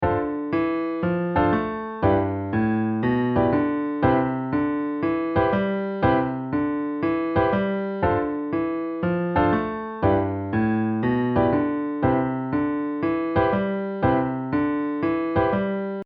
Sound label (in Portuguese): Walking Bass Padrao